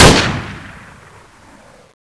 sterling bayonet, weapons

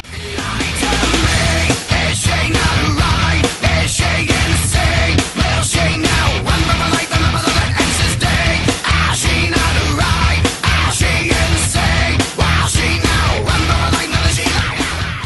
metal; roundsound

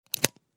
c4 unplug

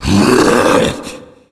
Tank Spot Prey; cstrike; RA Zmsounds

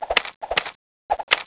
dualuzi idle, weapons

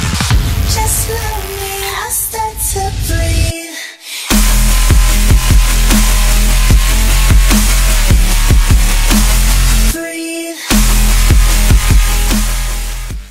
electro; roundsound

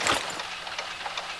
knife draw; spknife

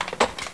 rl mag in, weapons; cstrike

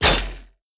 acid trap knife slash

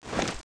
zoom in

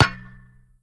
post hit

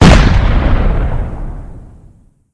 cannon; jailbreak

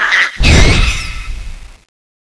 zombie skill; passzombie

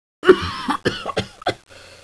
cough

